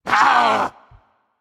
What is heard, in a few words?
ouch; smite; hunbatz